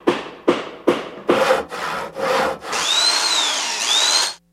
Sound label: Hammering Hand Saw; hammers